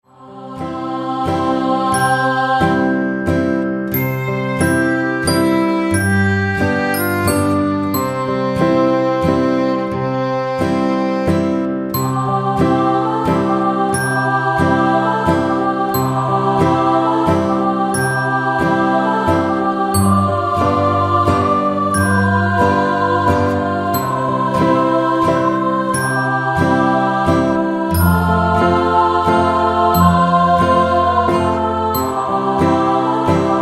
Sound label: Silent Night choir full mix